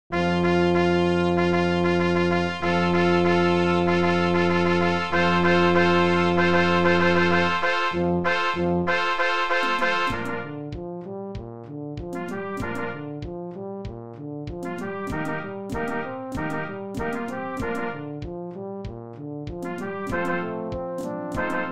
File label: Bugle rock; midi